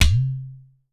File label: utar low